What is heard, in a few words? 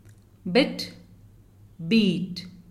Bit beat